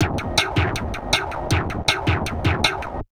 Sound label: DIST, Drum Loops Crazy Processed